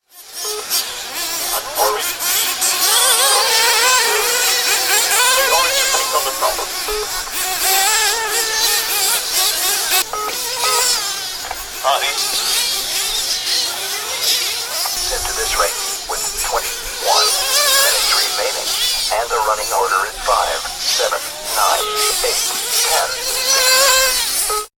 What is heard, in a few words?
Model Car Race, Recording of live race between various motorised remote controlled model cars.; Racing; Sports